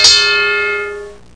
GOOD bell

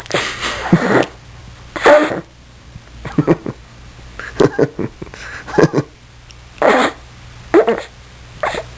Snickering and Laughing

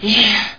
pant

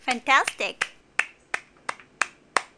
win sound

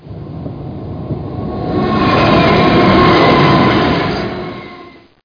Train; sw demo

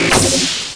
door

